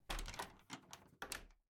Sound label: Door Back Lock; Game rip; MiSide